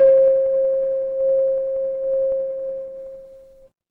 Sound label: instrument singing bowl